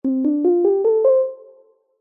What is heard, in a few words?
multimedia game sound soft warm subtle complete success